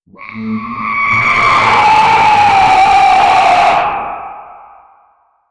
a large beast wendigo

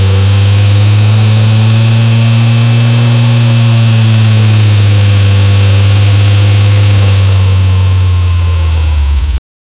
a huge v8 engine revving

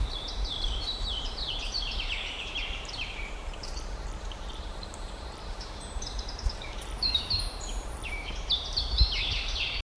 bird chriping

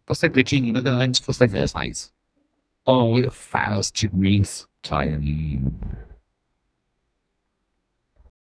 short eerie analog horror

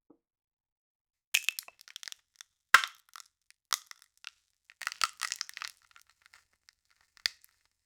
a cake crushing

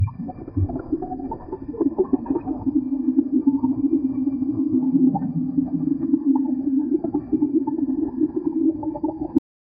sustained magical underwa

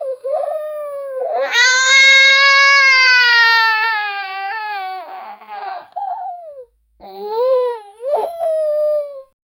child crying

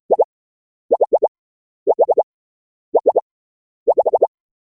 minimal digital ui whoosh

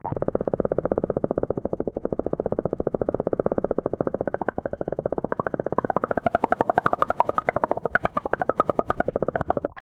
high pitched gurgles high

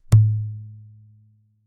single hit palm muted

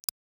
a short crisp mechanical

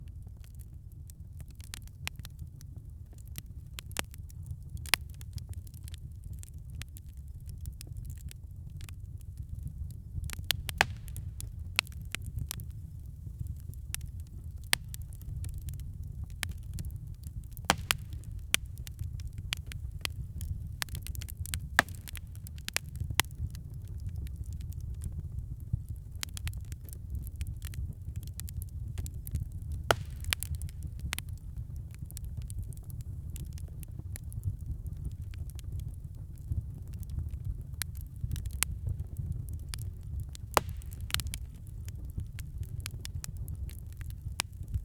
winter campfire crackling

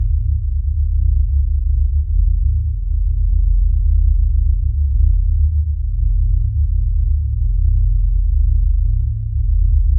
a dark minimal ambient, ambient

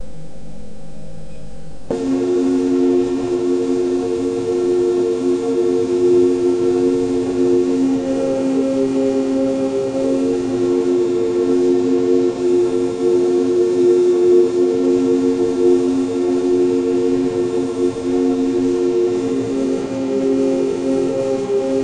study lofi sound

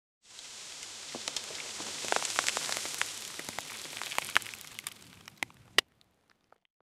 a cinematic soundscape

